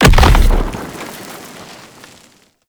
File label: Small Dax Bomb Explosion; Gameplay; Warframe; Tau